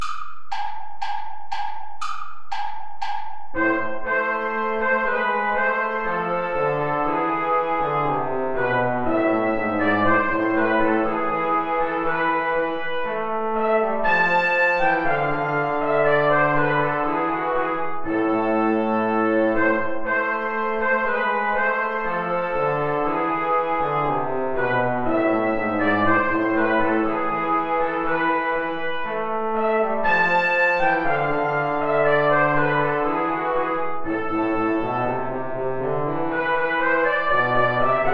play along without trumpet; hilton john; you lovers that have loves astray

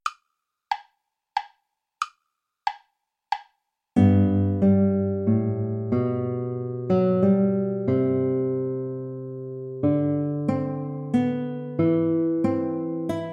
sarabande play along without flute

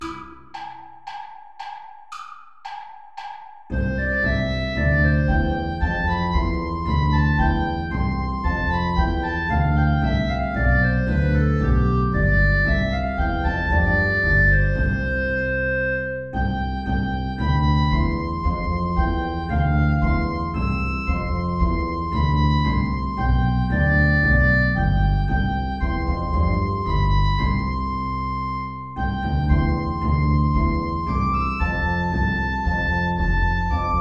air play along without clarinet; bach johann sebastian

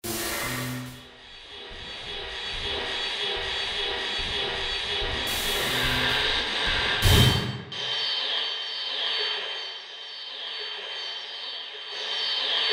piano and electronic ensemble; visual thoughts waves

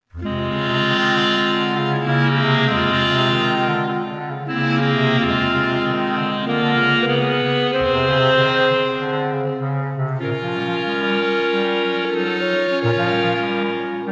interlude for clarinets; anderson gustav ostyn

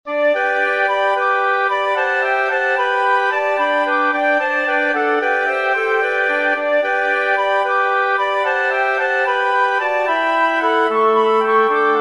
berceuse for woodwind quartet

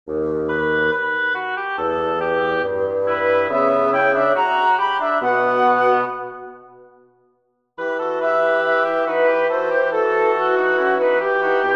recitative for woodwind quartet; bach johann sebastian; was hat denn bels getan

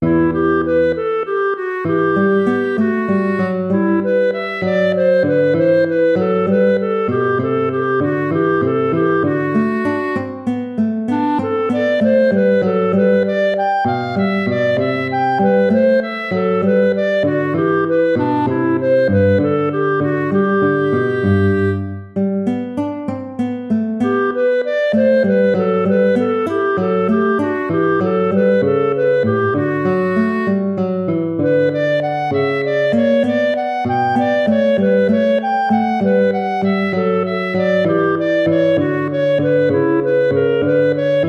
gigue

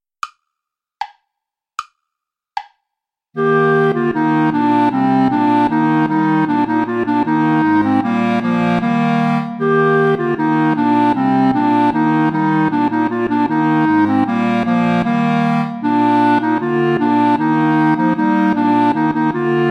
play along without clarinet; schma ckt den saal mit gra nen zweigen

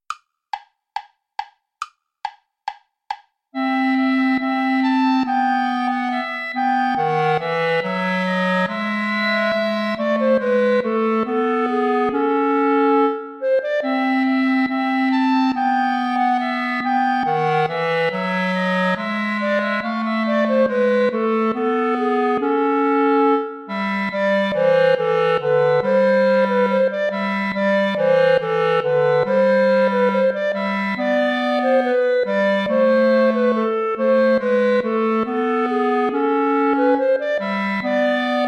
allemande play along without bass clarinet

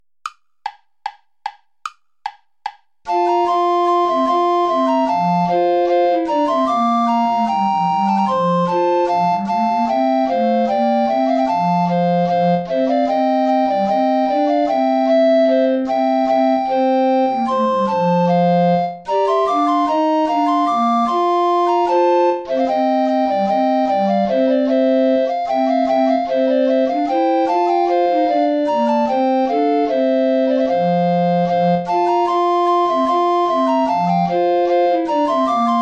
bourree tenor recorder; praetorius michael iii

